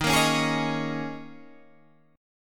G sharp Suspended 2nd Suspended 4th, guitar; 11,13,11,13,14,11

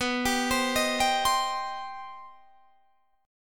C Minor B; Cm/B arpeggiated

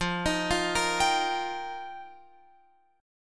F 7th Suspended 2nd Suspended 4th, Guitar Open F; F7sus2sus4; arpeggiated; x,12,15,12,13,14

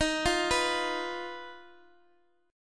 Eb Suspended 2nd Sharp 5th, Guitar, Open D

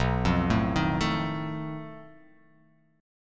B 7th Flat 5th B, chord

